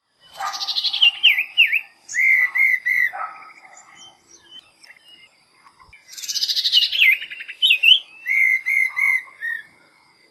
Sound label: Banded Bay Cuckoo Common Iora conflict calls Tambun Ipoh Perak Malaysia